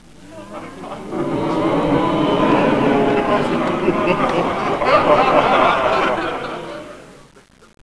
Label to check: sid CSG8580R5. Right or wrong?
Wrong